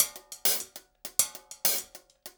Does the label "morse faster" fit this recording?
No